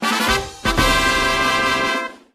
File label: Fanfare; anpanman to touch de waku waku training